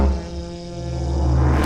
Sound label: Mus Tsunami 145 Light; From Dust; PS3; Xbox 360